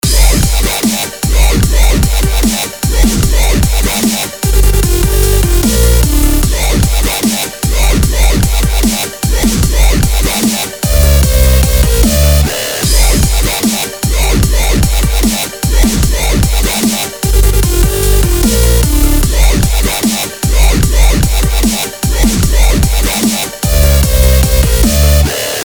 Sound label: dubstep